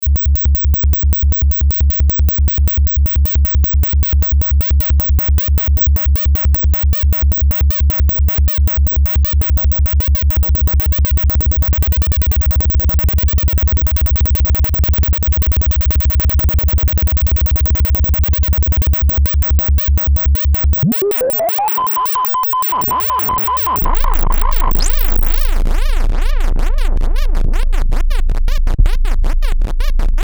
noise, korg ms20 synth; various FX

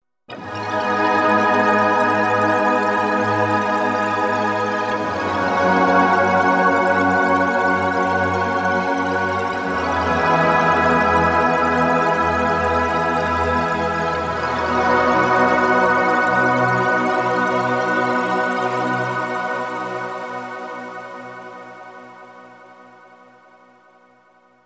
korg m1r synth

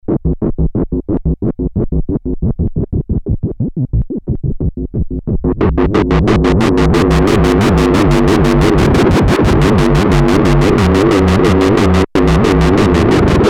synth alien, Moog Minitaur; analog monophonic synthesizer; Alien giger

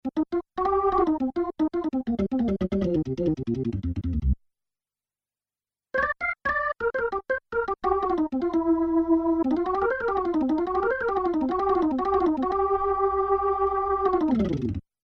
org b3, Roland dj70

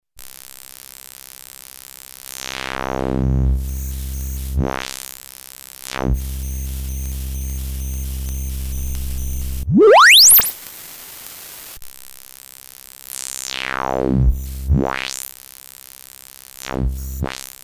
highpass, VCF highpass; TEST: VCF highpass; Polivoks formanta

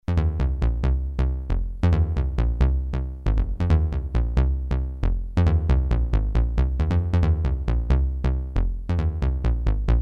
yamaha tg33

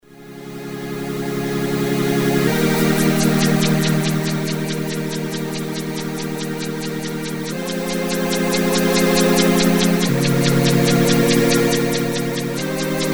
string lfo, Roland alpha juno synth

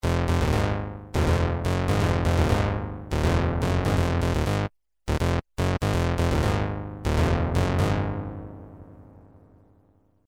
synth ax80, akai x 7000

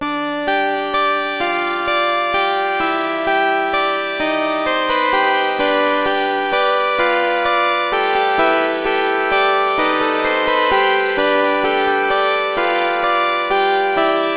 synth, yamaha tx81z

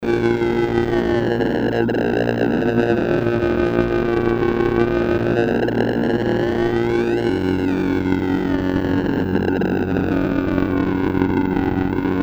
synth raw, raw sounding; Polivoks formanta; FX: raw sounding

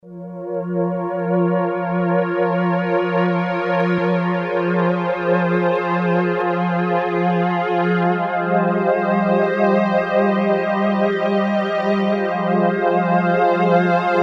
pad chorus, Kawai sx240

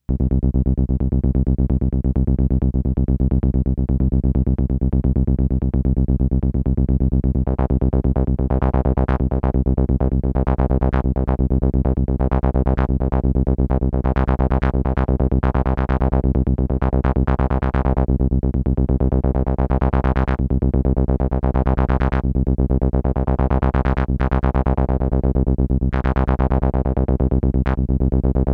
pan, novation super bass station

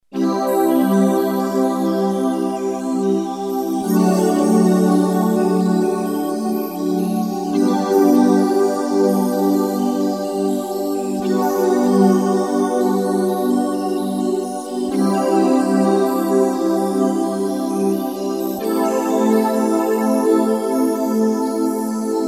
PAD vector shimmer, Roland JD800

